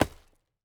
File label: lumber tree